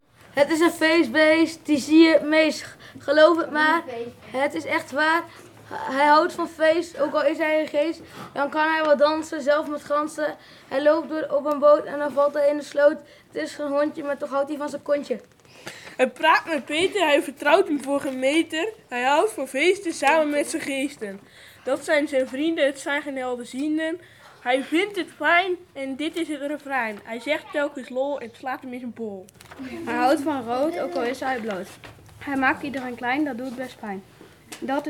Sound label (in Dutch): rap